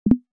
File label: tap